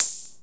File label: Roland CR78, drums